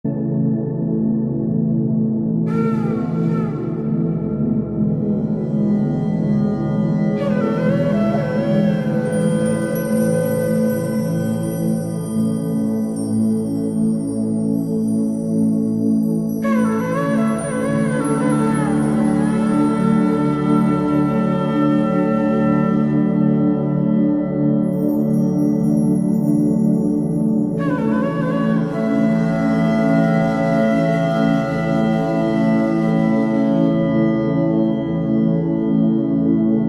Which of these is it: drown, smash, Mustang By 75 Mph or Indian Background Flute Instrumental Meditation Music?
Indian Background Flute Instrumental Meditation Music